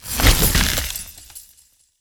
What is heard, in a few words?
Ice